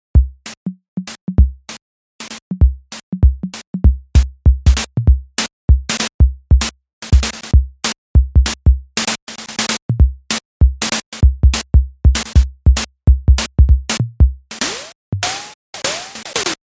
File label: Drum